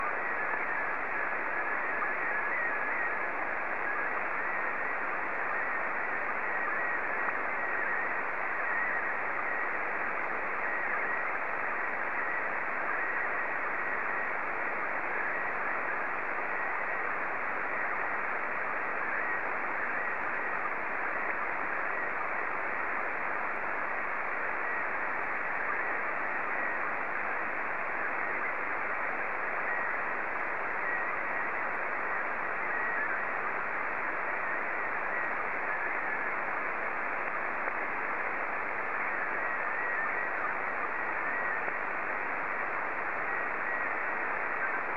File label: SSTV, PD50